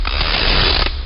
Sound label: attack; tripodhopper